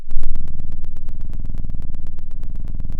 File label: pop, Sound of an engine revving